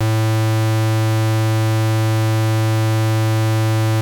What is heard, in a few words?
square, 808, SubOsc; hiphop; StudioLinkedVST 808 Kontakt